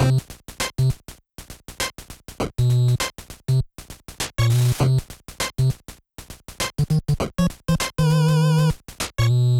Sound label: Good for Chopping, Loops